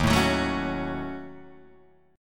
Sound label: D sharp Minor 7th Flat 5th D sharp down Guitar Open G